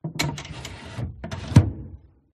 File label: Close Drawer, Old, Wooden, Movement, Drag; PMSFX